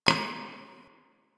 Idle Heavy Hoof Stomp; Ambience; Warframe; Entrati; Tagfer

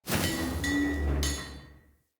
Kintsugi Pickup

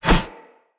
Sinecure Curved Sword Whoosh Right Left, Swords; Weapons; Player

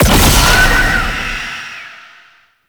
Unhallowed Curse Hit; Warframe; Dagath

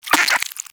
Vocal Tranq Grunt, Hunting Critters; Nexifera

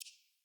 UI Default Theme Focus

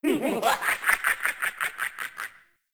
Laugh; Anna Ki; Warframe; Caliber Chicks Minigame; Boss

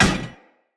Hammer Impact Metal; Weapon Impacts; Player; Environment; Tuvalkane Hammer

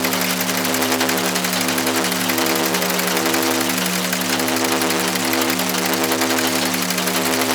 Rotating Metal Platform Loop; Warframe; Tau; Ambience

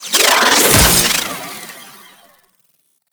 Hack In; Parazon

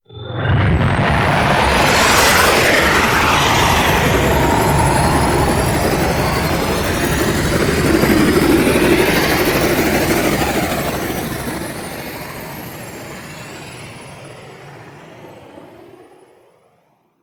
Blue Sky Liset Relay Undocking, vehicles; Warframe; Liset Flight

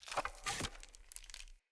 Staff Caster Un Equip; Weapons; Player; Staves; Starter Staff Caster

